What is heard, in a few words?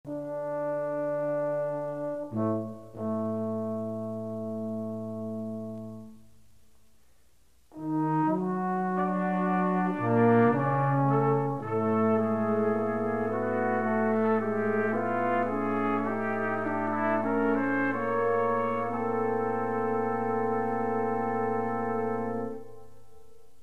Brass Quintet Largo, Allegro